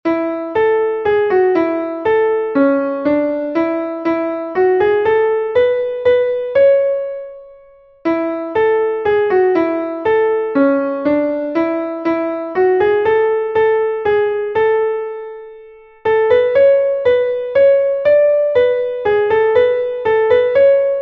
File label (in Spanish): soprano, himnos en piano; cantos del camino